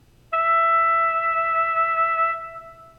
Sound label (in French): instrument Mi saxo; chapitre 08 Signal sonore frequence niveau sonore